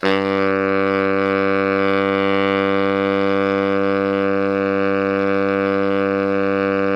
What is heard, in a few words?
BARI PP G1, saxophones; Greytsounds - Philip Wolfe David Crozier's Saxophones